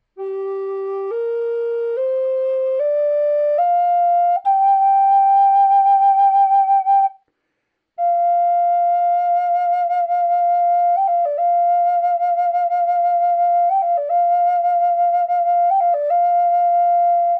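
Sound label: natural, flute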